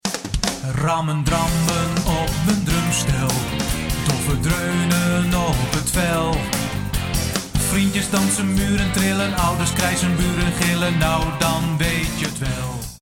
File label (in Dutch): drumstel; M'n drumstel; Instrumenten; Eigen-wijs; instrumenten improvisatie ouders